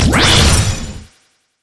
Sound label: shield; rumble skin03